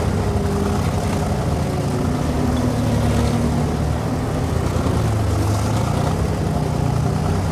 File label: engines; World of Tanks